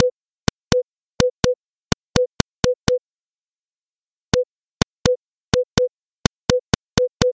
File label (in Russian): elektronnij signal s postukivaniem rukoj